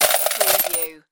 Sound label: Slot Machine Win - Coins Falling Into Payout Slot